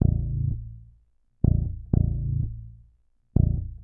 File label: DEEPHOUSE BASS 125 A